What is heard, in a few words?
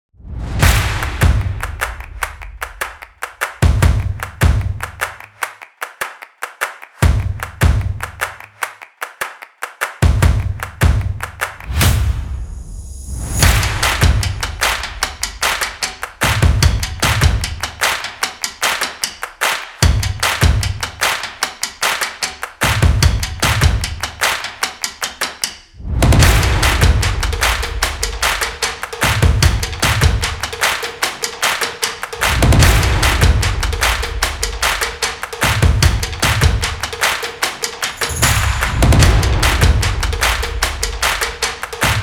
Action Drums no vocals